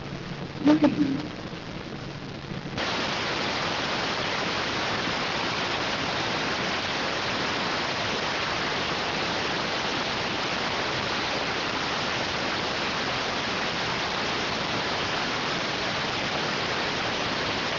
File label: rtty rx